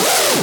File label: Synths F; vengeance essential club sounds-3